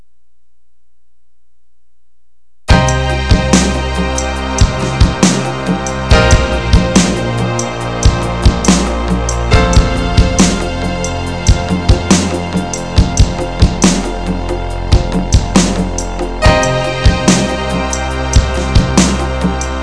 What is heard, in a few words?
SAD SONG